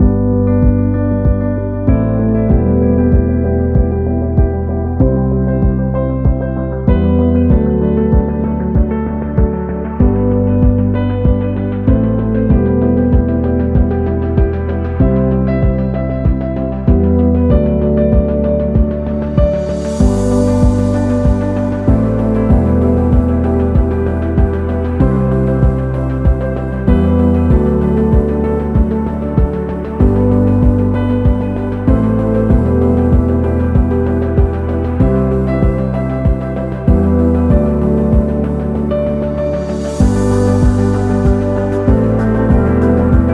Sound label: Relaxing Piano; waiting music